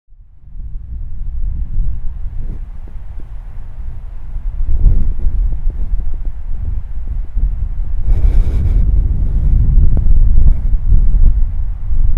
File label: wind draft